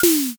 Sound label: umbrella